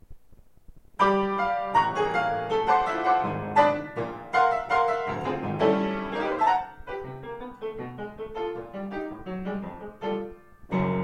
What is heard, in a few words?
Piano rag music